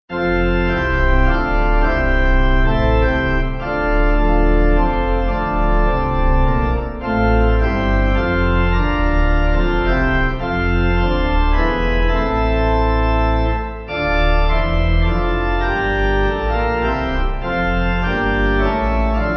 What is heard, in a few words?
Pipe